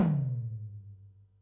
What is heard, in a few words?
tom, Pearl SC-40 Toms